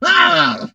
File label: hurt vo; 卡通工作室